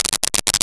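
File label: electric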